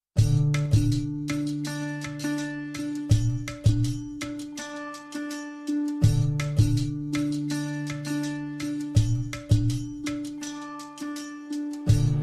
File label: Bgm